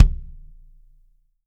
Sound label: Kick Remo